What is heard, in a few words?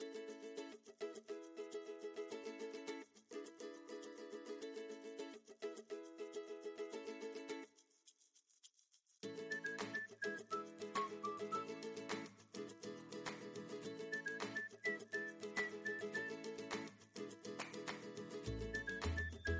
music cheerful; bgm